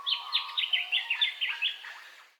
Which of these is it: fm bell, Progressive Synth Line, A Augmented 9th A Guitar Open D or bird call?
bird call